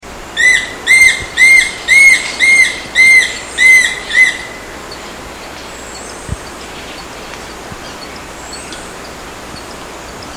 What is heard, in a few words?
yellow browed woodpecker